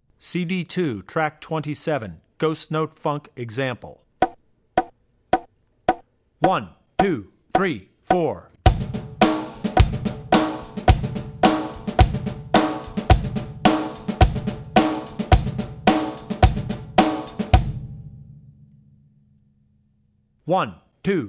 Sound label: Funk - Ghost Note Funk; Drummer's Bible Tracks